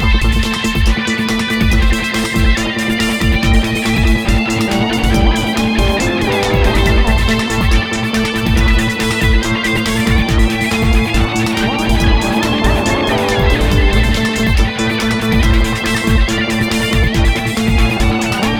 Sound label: Riff